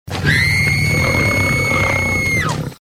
scream; svencoop; FZSaySound

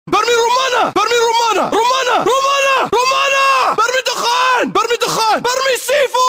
shout; svencoop; FZSaySound

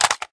mag; asval; svencoop; ins2

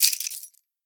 throw, m60; svencoop; ins2

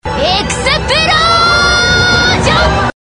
explosion; svencoop; FZSaySound

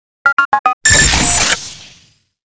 nuke beacon set; weapons; svencoop

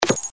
menu select; svencoop

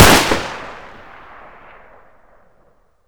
m14 shot; svencoop; hunger

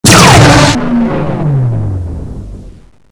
gluongun fire; svencoop; custom weapons

